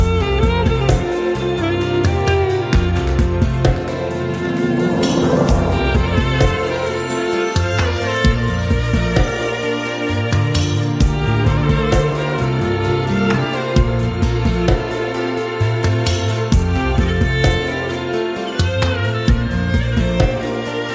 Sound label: violin cover ringtone